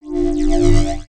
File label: Charge